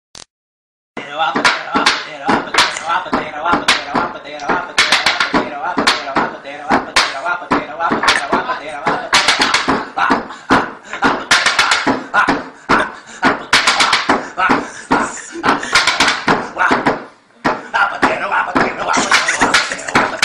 rap

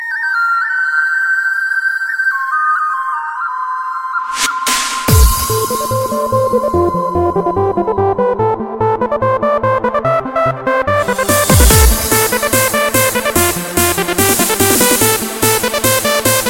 Dance of flut

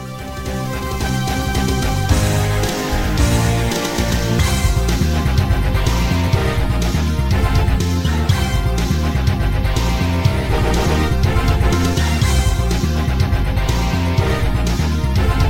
Coolest Arcade Tone

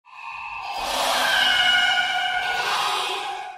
Songbird Sad Call

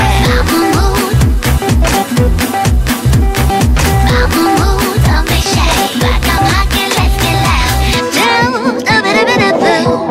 Electro Swing

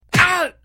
Punch

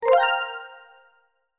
quiz start

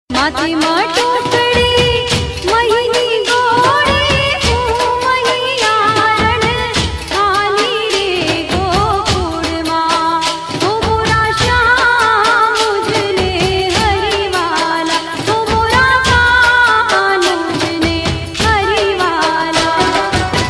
Garba

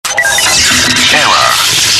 error

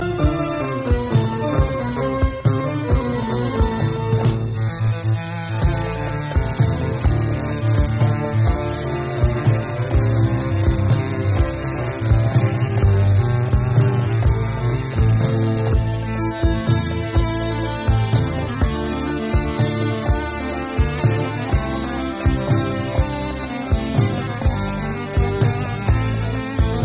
Assyrian

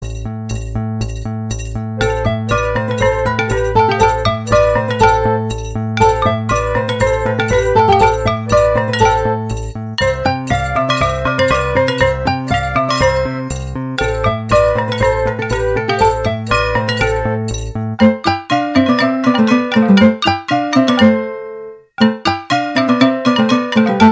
Pipes

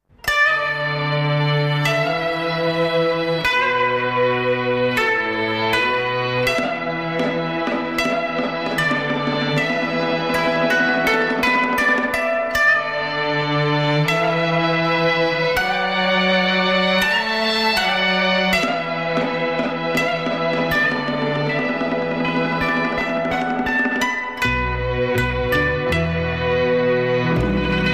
Traditional Japanese

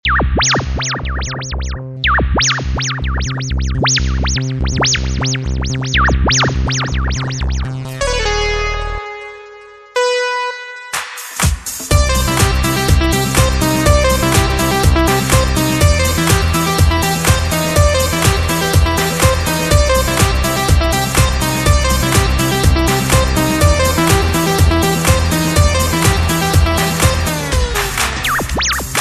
High Pitched

